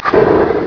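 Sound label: flame thrower; mk2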